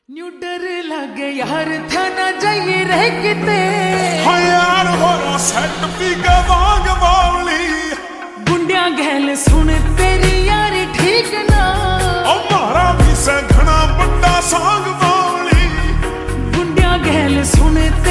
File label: Slowed Reverb Ringtone